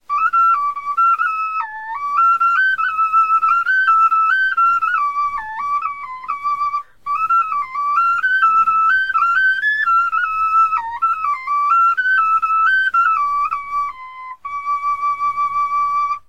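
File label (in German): melodie, Knochenflöten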